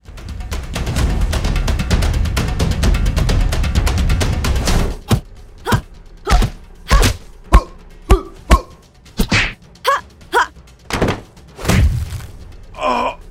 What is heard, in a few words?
close combat sfx pack